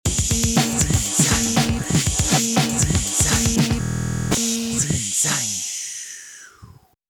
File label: glitch